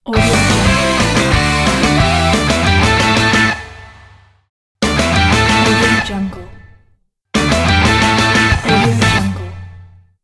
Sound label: rock logo